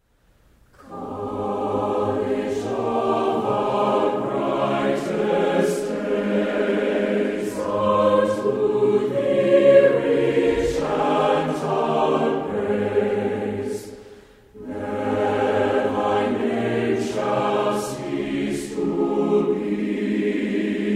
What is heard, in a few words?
choir; loyalty